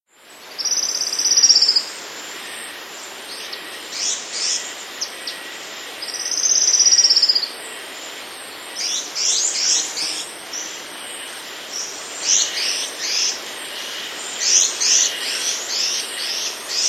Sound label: Foothill Antwren; S. America